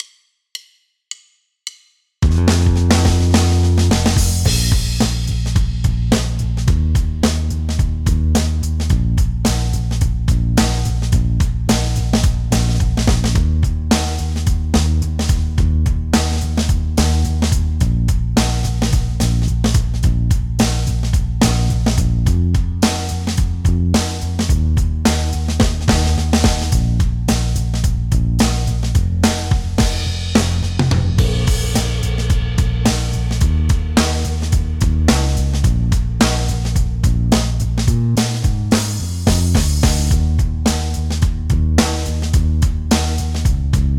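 blues in Bb, even rhythm without swing, without harmony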